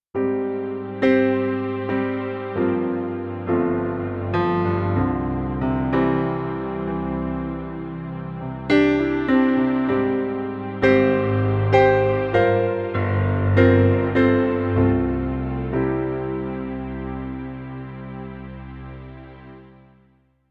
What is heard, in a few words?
Piano and Pad; Pianos; MP10